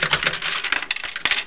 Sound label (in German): klacker